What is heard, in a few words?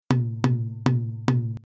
interpretive drums